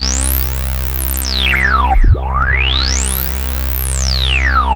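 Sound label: synth sweep, House FX oneshots; E Lab; XTc Files of House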